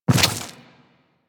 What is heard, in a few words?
Infested Small Land Dirt, Footsteps; Warframe